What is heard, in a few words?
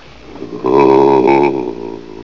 oooooooh